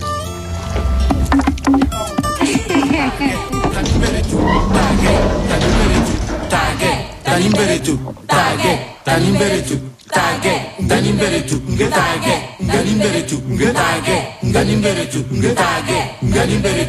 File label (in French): Chants africains